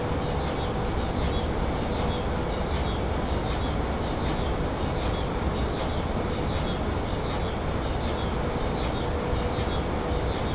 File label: paranal ut1 ambient; soundscape